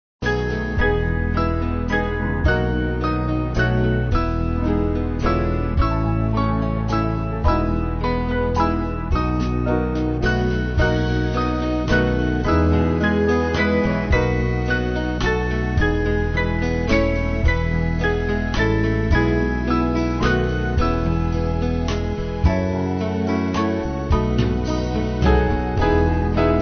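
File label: Band, Music Backing; Blest Be The Tie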